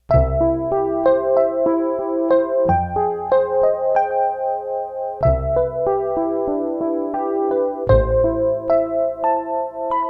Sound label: string bass e piano